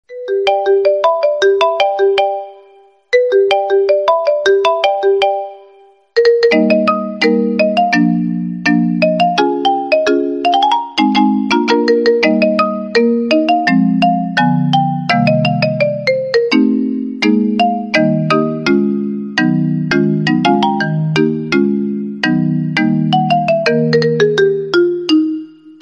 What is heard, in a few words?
marimba remix ringtone